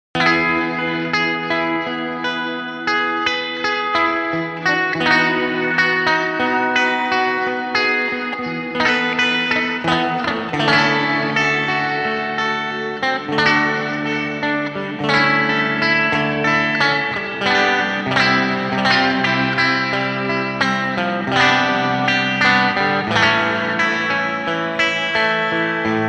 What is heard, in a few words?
strumming focus reverb; warmth dev